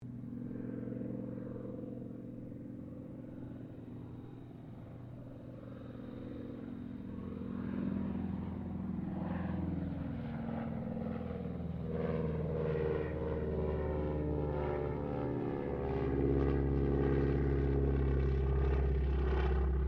AIRCRAFT CAC CA-25 WINJEEL PASS DISTANT, fighter trainer; RAAF Winjeel fighter trainer; Airplane Sound Effects; Airplanes (Sound Effects:Vehicles)